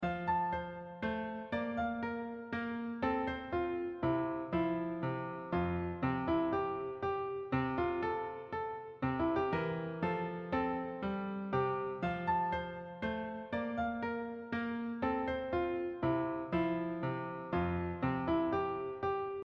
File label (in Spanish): Minuet in fa, Piano; Clasicos